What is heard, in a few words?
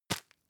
tissue tap hard